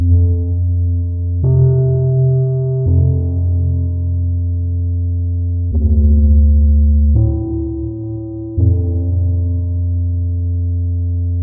stem bass, music loop; timber

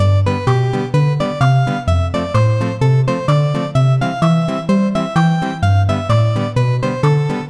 loop, music loop; vellum

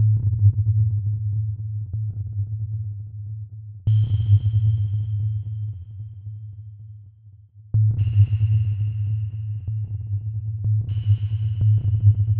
stem drums, music loop; anvil

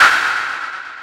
step, sfx; anvil

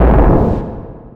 explosion; rust game

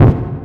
hit, sfx; rust

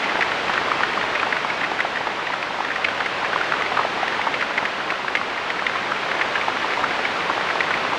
rain, ambience; vellum

